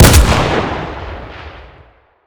saiga; garrysmod

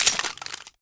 beretta m92 clip out; beretta92; garrysmod

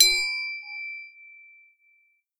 m60 starter tab; mg; garrysmod

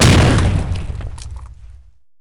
claymore explode, weapons, explosive claymore; garrysmod

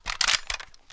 g3 bolt pull, weapons; g3a3; garrysmod

